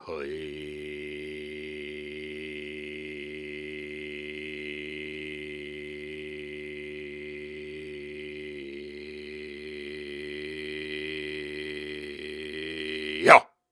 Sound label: Sustains, Viking Vocal